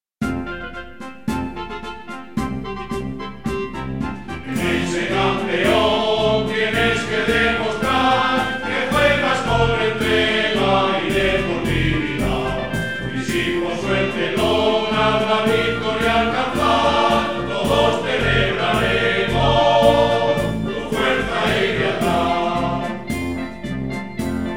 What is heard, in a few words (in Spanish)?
HIMNO, Coro; HIMNO SD LENENSE